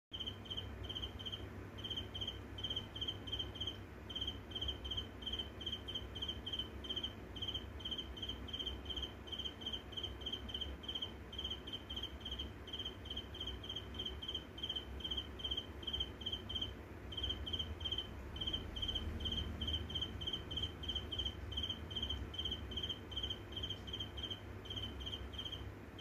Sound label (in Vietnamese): tieng gian keu, tiengdong